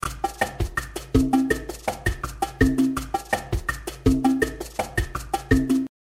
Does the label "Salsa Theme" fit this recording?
Yes